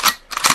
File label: reloading